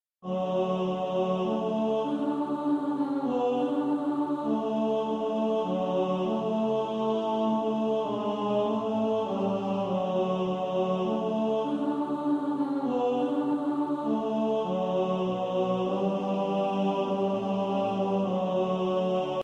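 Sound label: tenor; Praise him all ye little children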